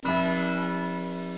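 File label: church bell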